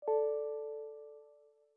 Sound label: Windows Message Nudge